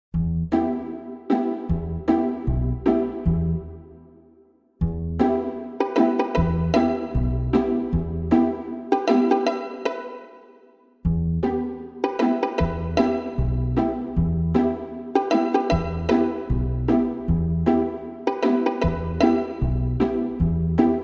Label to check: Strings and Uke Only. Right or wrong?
Right